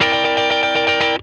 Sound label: C JANGLE, Funk Guitar; Zero G - Funk Guitar